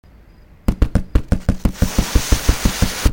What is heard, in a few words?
Pickaxe; Stealing The Diamond; The Henry Stickmin Collection